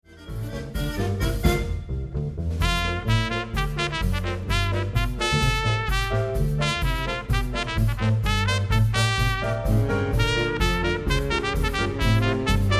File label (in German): ohne daempfer